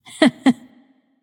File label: heh heh light; smite; voicepack; hel